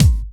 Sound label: Soft Kick; Vengeance Samples